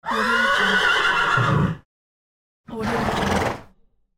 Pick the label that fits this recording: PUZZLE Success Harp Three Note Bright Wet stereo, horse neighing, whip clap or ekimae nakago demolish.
horse neighing